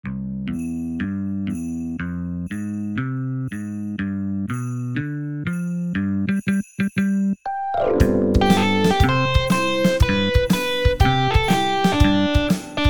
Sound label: X'mas Rock Version; E-card; 21ST CENTURY COMMERCIAL MUSIC